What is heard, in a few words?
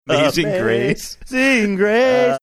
singing amazing grace funny